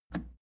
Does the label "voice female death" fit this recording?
No